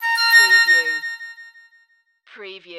Multimedia Chime

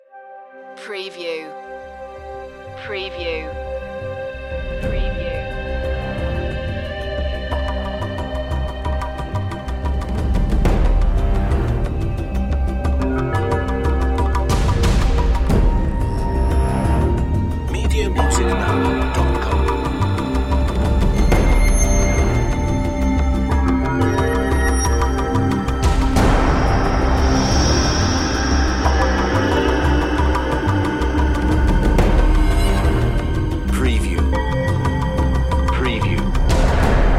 underworld series dramatic cue

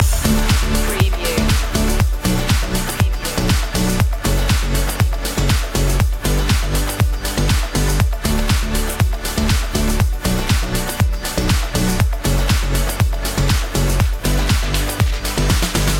sax me up loop

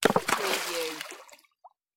Rock splash

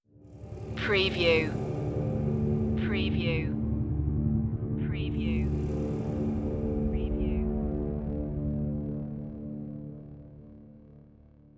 anguish stinger

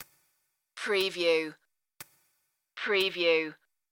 tick tock tension loop

